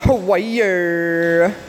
Wire ringtone

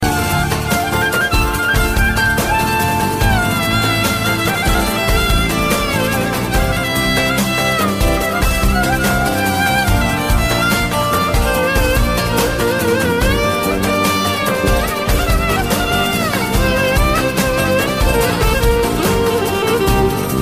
Slow Violin

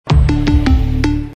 Volkswagen endsound

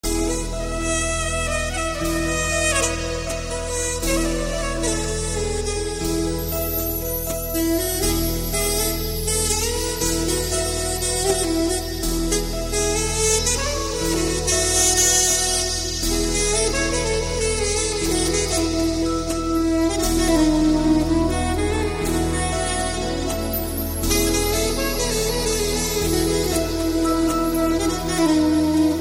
Saxaphone

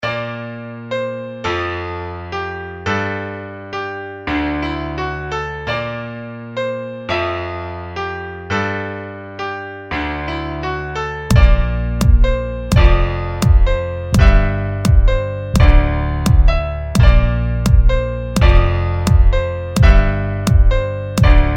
trumpet

